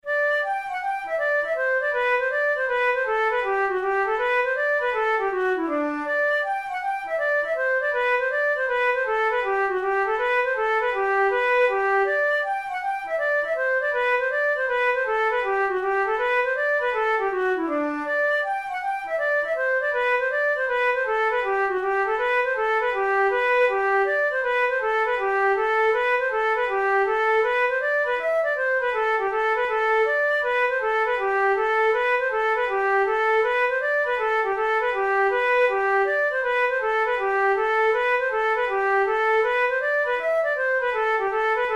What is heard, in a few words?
hornpipe, flute tunes; Mrs Wilson's hornpipe